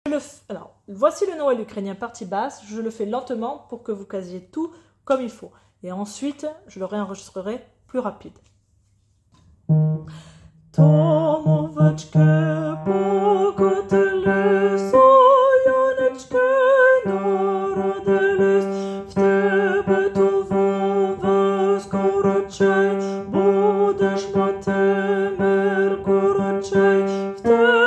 partie basse; Chedryk

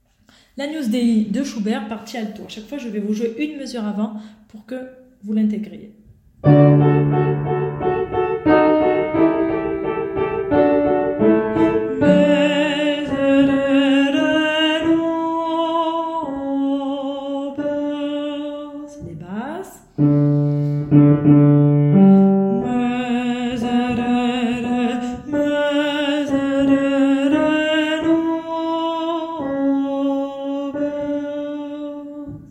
partie alto; Agnus dei

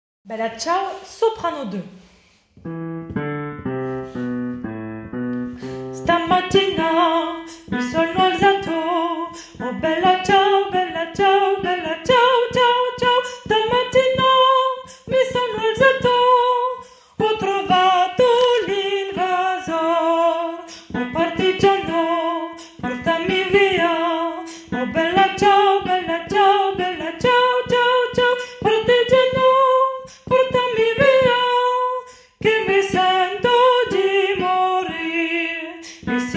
partie soprano 1

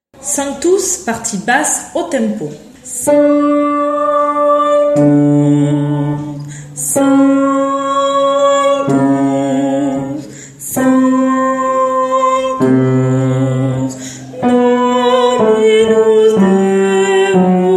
Basse; Requiem; Sanctus; Mozart

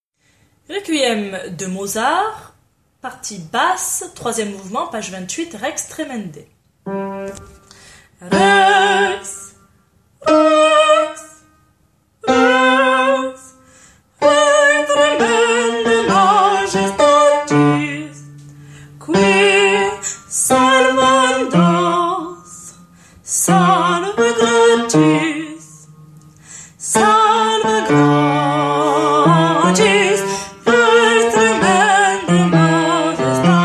Requiem Rex Tremendae Basse; Mozart

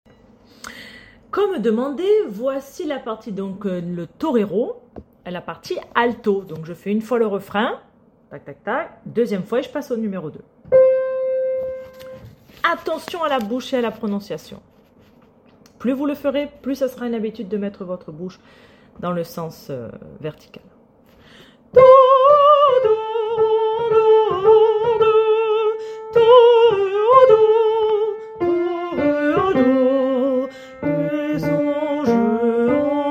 Alto

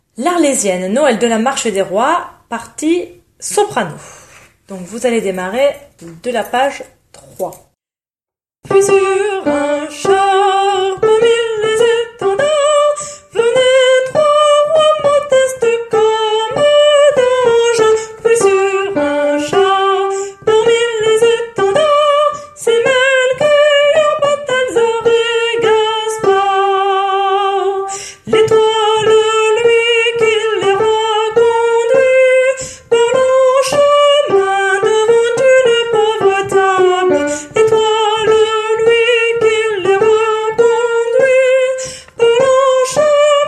La Marche Des Rois Soprano; Bizet

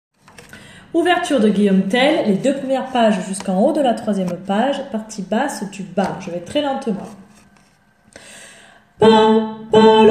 Basse bas; G.Tell

